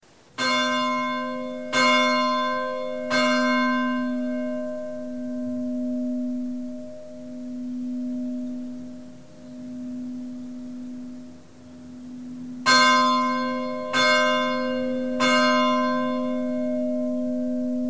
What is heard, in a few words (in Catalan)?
campana